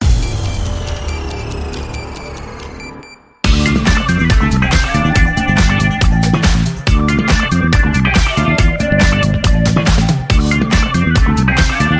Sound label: music idol carry; urn run; Deceive Inc extraction